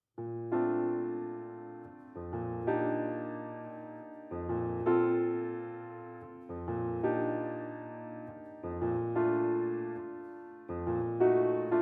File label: accompanyment only